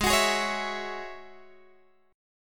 Ab 7th Suspended 4th Sharp 5th Ab down Guitar Open G